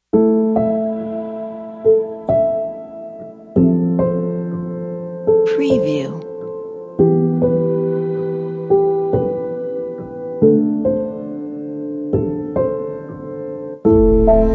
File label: loneliness; آهنگ ملایم مخصوص تیزر